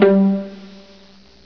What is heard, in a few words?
VIOLA G; MUSIC; INSTRMNT